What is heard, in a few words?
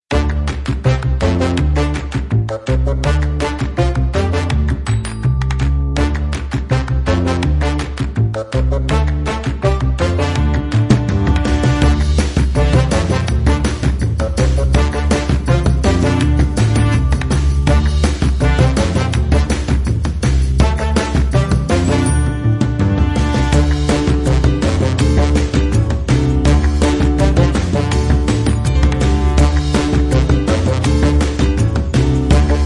Retro funk jazz background music